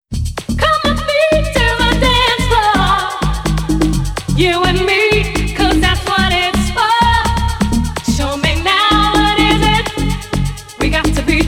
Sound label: Acapella